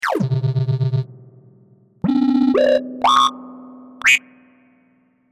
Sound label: Bubbles